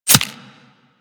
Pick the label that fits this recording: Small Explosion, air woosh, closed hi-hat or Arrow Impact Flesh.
Arrow Impact Flesh